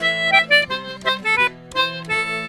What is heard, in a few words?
C3 R, Celtic Flavours; USB Soundscan vol.42; AKAI